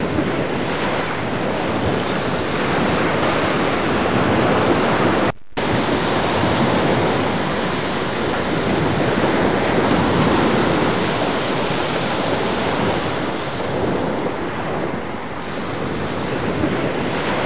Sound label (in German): meeresrauschen